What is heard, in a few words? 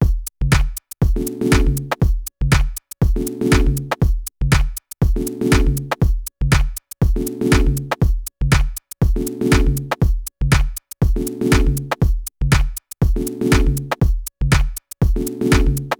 S3000 vs S3000XL